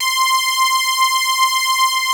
POLY SYNTH; Wizoo - Powered Wave

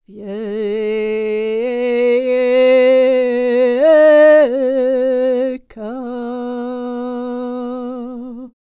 SERBIA, Vocal World Voices SERBIAN

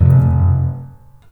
THUD, The Piano; InVision Interactive

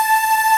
Ending loop

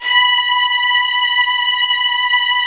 VIOLIN; VIOLIN1

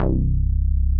C1, MOOG1 BASS

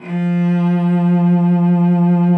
F3, cello vib; Xsample VOL-7

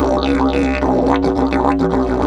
108, DIDGE 108BPM

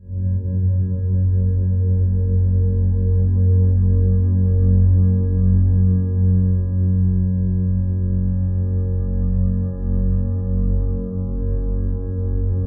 louder, low tones, ominous drones; USB Soundscan vol.48; AKAI

